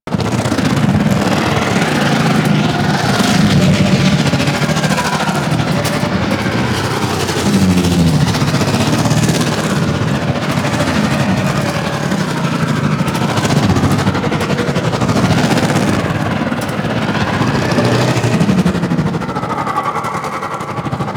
Skiff Engine Loop Medium, Vehicles; Warframe; Grineer Skiff Player Vehicle

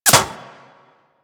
Bullet Impact Metal; Warframe; Weapons

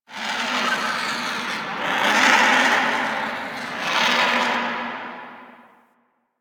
Dungeon Island Vultures, Ambience; Warframe; Duviri

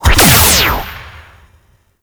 beam attack shot; Enemies; Warframe; Vomvalyst; Teralyst; DE

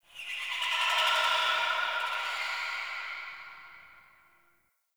Bat Chatter; Warframe; Infested Eris; Ambience; Outdoor

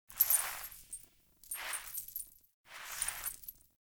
Foley; Friendly; Verminia; Bromius Quest

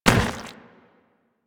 Zombie Run Metal, Footsteps; Warframe; Infested

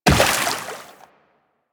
Run Water, Footsteps; DE; Warframe; Tenno

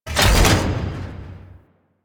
Weapon Switch Two; Warframe; Railjack

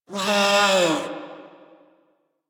Feral Vocal Death; Warframe; Catbrow

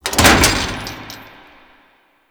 Shipyards Walkway Open; Ambience; Props; Warframe; Grineer Shipyards

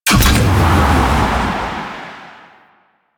Void Dragon Jump Off; Enemies; Warframe; Duviri

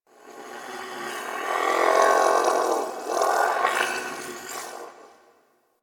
Sentient Lair Interior Creature; Ambience; Creatures; Misc Creatures; Warframe

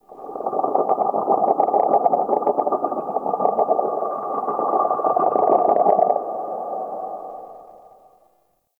Under City Texture Low Croak

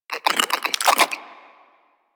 Vocal Idle, Hunting Critters; Warframe; Cryptilex

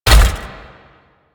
Melee Jump; Warframe; Necramech

